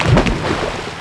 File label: sewage enter